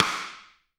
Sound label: STICK, Drum 1 Dry; East Collexion